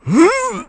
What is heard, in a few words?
Sumo Pose; Mayor Bo posing to start a sumo match